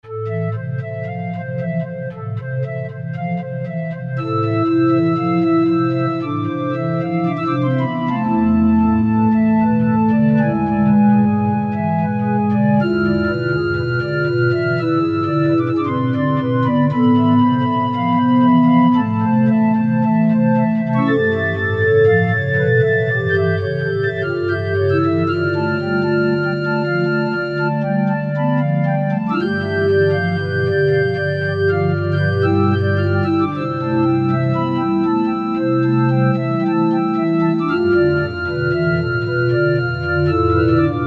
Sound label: Pipe Organ, Korg MSC-9S Organ